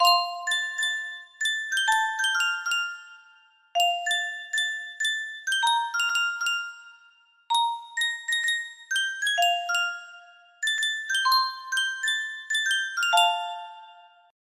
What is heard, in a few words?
sankyo custom tune music box, music box melody